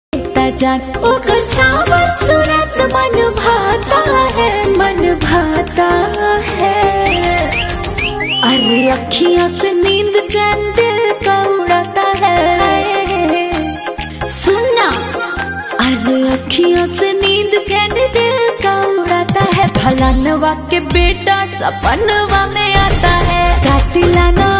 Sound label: bhojpuri ringtone